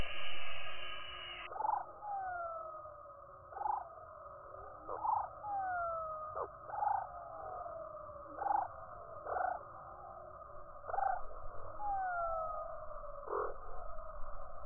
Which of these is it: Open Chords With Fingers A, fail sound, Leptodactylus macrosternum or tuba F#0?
Leptodactylus macrosternum